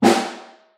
Snare Far

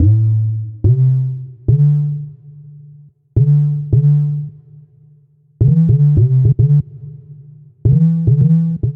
Deep FM Bass A Minor 107 bpm, Bassline; Tonal; MIDI Clips; Drive and Glow; Ableton; Factory Packs

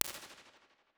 Tremolo Reverb, Unsusual Reverbs, IR Samples; Convolution; Kontakt 5

